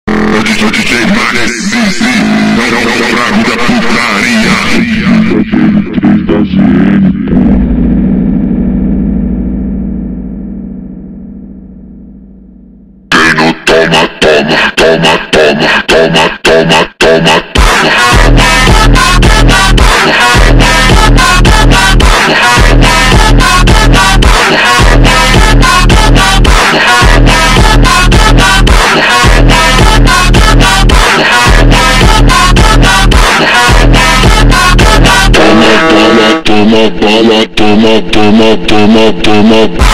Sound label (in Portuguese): montagem vozes profundas slowed reverb